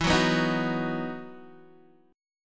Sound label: E Major 7th Suspended 2nd, Guitar, Open D